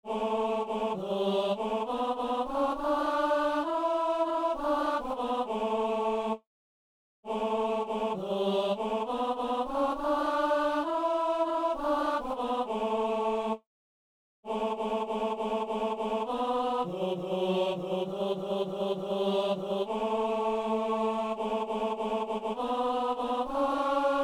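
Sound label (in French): messe sanctus ténor; messe de saint boniface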